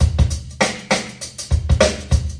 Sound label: 100 double everything distance, drum loops and breaks; 091 to 100 bpm